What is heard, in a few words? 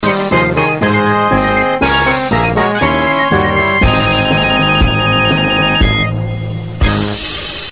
fanfare